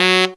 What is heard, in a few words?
TENOR F#; Zero-G; Phantom Horns